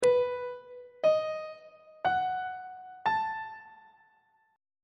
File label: c sharp minor 7th chord 7 is b dominant 7th on treble clef